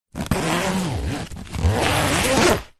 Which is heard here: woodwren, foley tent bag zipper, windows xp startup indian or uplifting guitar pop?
foley tent bag zipper